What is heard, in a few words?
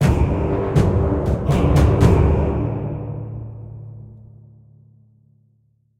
Battle Lost; Worlds of Magic: Planar Conquest; PS4